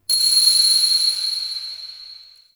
Interior Orbital Station Ring; Warframe; Tau

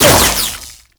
Practice Orb Ricochet; Mod Quest; Warframe; The Teacher

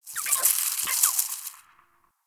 tree critter; Warframe; The New War; Zariman

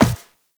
Walk Metal, footsteps; Warframe; Characters; Operator

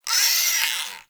Exterior Forest Critter; Ambience; Duviri

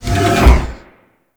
Orokin Small Door Open; Warframe; Doors

